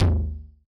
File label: Yamaha DJX 2B, Hard Tom